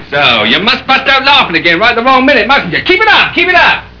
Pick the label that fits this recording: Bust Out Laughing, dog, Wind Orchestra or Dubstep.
Bust Out Laughing